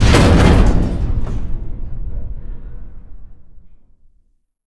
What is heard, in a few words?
hit ship